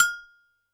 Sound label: G4 Agogo H, Yamaha PSR-E323 Standard Kit